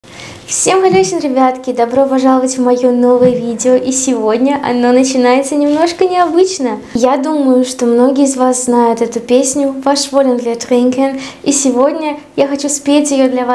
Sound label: russian german version